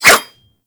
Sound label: Dagger Whoosh; Warframe